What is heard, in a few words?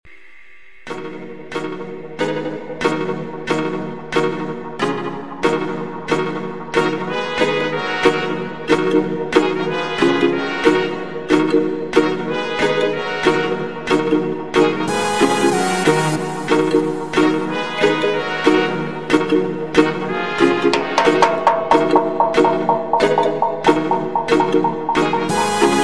drum and bass